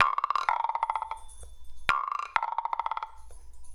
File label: GUIRO, Ethno Techno; ILIO - Ethno Techno